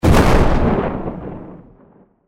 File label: explosion; Lucha Rumble